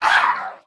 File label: gnoll damage, monster